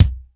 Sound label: DRY K, drum; Crypton; DrumBase5000